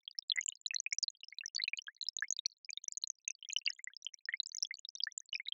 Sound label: rain drops Water